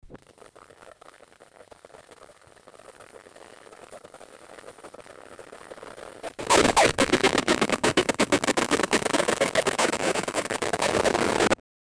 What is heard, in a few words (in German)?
zwei raben die kurz krchz, cawing